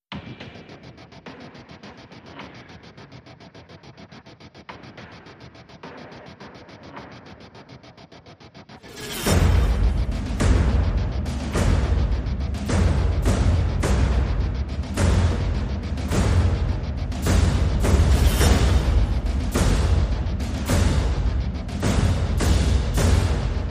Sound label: Perc and Pulses